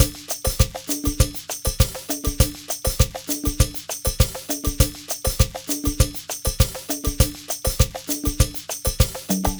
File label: Ensemble, Latin Percussion